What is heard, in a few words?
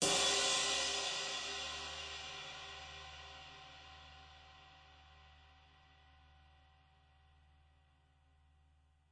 Darkest Crash, DrumKit; REAL LIVE KITS; Osama Qureshi DrumKit